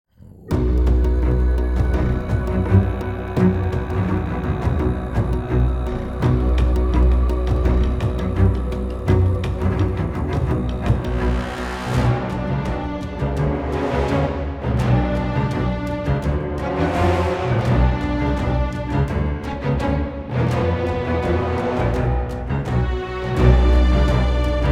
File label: Fight Loop; kwolok Hollow Hornbug